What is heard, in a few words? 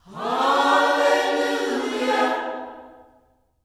HALLELU, Gospel; Spectrasonics; Vocal Planet Vol.1 Gospel; HALLELUJAH